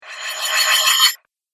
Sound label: Metal Spinning Blade